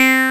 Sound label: MATRIX 12, OB Synths